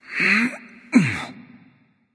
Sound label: gruntlift; smite